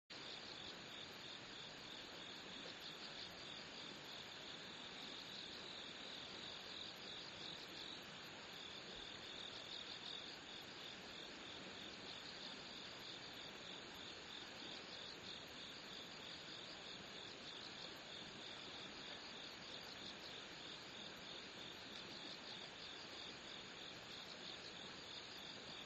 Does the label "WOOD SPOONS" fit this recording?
No